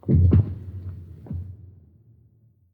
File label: Random Ice; moul